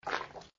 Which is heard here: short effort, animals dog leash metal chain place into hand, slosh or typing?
slosh